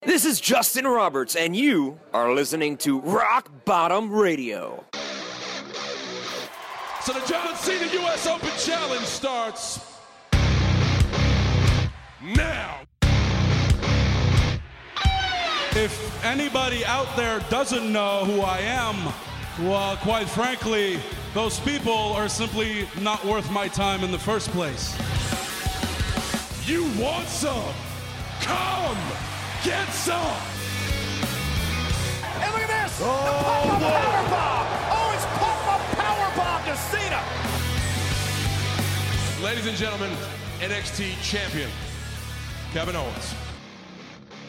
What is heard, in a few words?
radio jingle; rock bottom